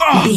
voice male hurt pain

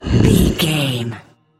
troll monster effort growl small

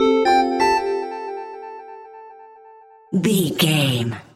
jingle chime positive

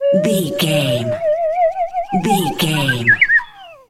whistle slide wobble notes long

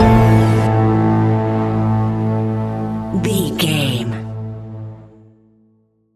malevolent resonance modern horror stinger

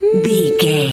voice fun small character emote interested

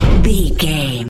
metal robot impact med step

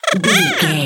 goblin fairy expression emote

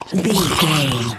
zombie voice eating chewing

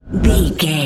whoosh slow deep

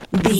footstep dirt land

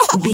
voice funny cartoon misc

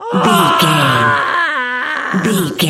voice female scream low

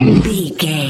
troll monster hurt pain short

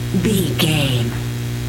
hum electric light neon loop

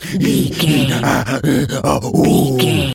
gorilla chatter

